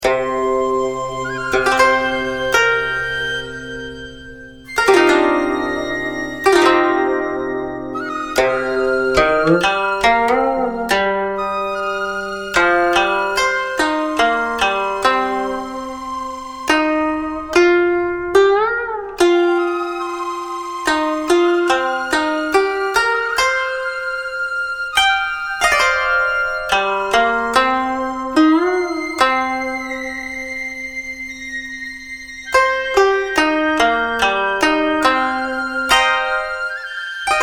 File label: Chinese Zither